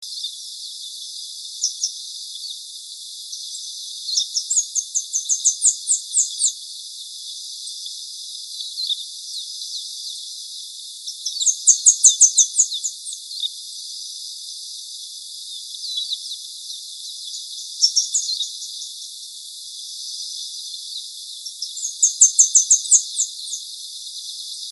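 Cerquero de Collar, Arremon flavirostris, Saffron-billed Sparrow; cantos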